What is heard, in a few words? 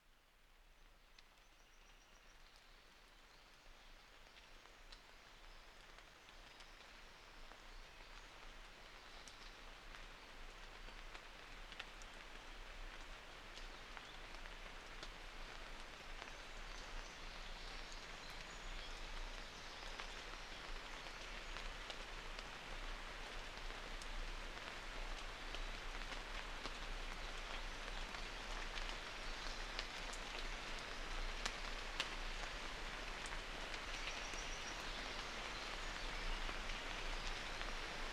Music Nature Morning Rain; Relaxation & Meditation with Music & Nature